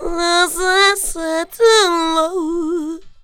VOX LOOP, ADLIB WOM, Funky Vocals; USB Soundscan vol.18

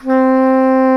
SAX SOP MP C, Soprano Sh; Roland LCDP07 Super Sax

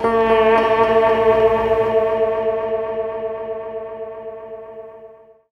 Sitar

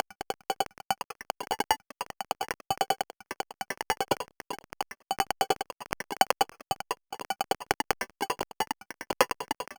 clicky 8bit perc loop; M8; percussion and foley

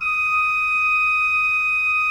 RFRP D5, Cathedral Organ, ROHRFLOETE; Propeller Island

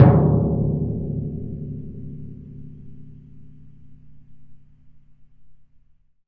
TMP, Synclavier Percussion; ILIO; Synclavier Percussion 2; World & Orchestral

